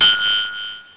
ding; rtfire

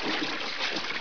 swim

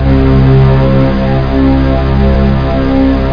LW STRING; Groovy Bytes: Behind the Moon

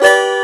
C Major, guitar; The Guitar Tutor; Educational

